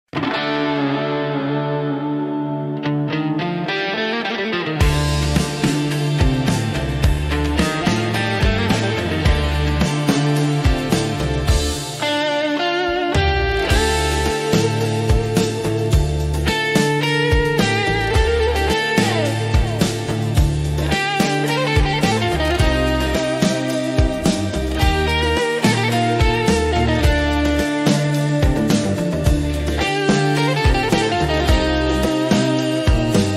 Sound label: psychedelic rock cover